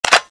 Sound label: clipout; balrog7; weapons